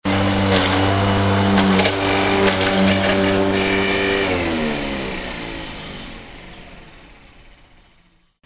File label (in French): Tondeuse gazon; bruitage; Electro-menager